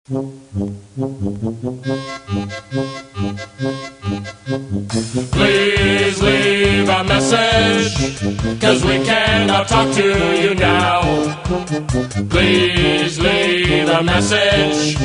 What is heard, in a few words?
Polka